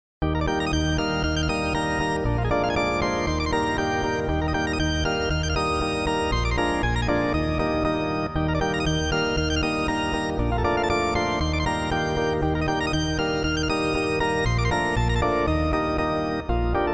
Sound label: Atlantic Polka